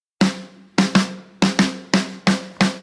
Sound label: ringtone